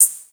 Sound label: Shaker